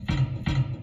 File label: Manhole